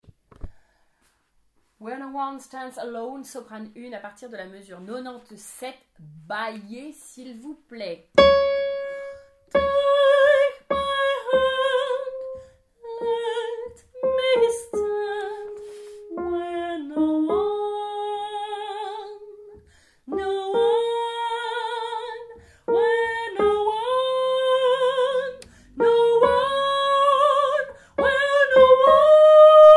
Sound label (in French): soprane